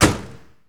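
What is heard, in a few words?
Ferrari Testarossa Trunk Close, Automobiles; SFX Kit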